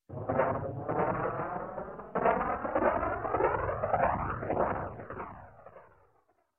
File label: Gun, Anti Aircraft Anti-Aircraft Gun Several Sh; Shots; Sound Ideas 2000 Series